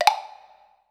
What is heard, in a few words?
block; Game Sound Solutions - 8 bits Elements; Buttons Colletions Demo